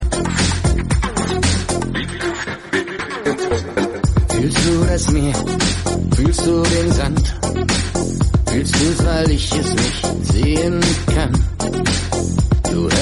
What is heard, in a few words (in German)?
elektronischer deutscher klingelton